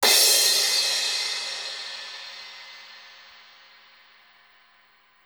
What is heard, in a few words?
Garage Crash; Dance DJ; Drums; Cymbals; Digidesign; Pro Tools Essential Loops